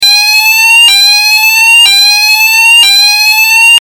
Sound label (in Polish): ton